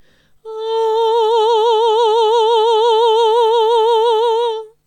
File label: Vibrato pur